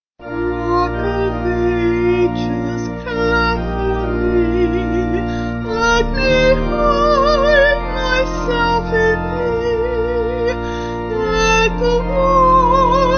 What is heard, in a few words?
Vocal Organ